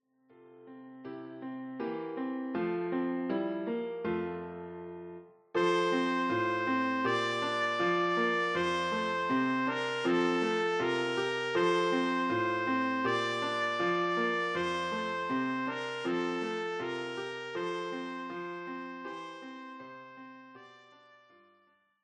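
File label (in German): O du froehliche, Trumpet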